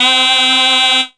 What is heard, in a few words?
E-Mu Proteus FX Shanai C4